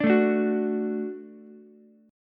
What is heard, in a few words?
D-Major, D, Ukulele; D strummed; JGuitar